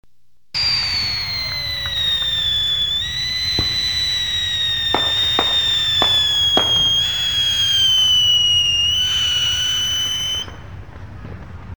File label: Fireworks